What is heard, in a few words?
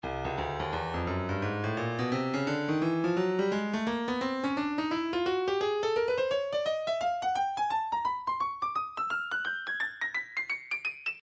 breakbeat midi files 085 089 bpm; swagga a; Stylus Breakbeat Midi Files